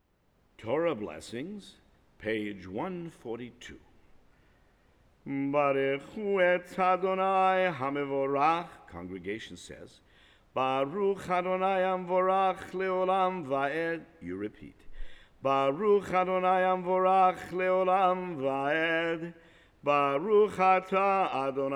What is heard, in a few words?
torah blessings; VBS Tfilot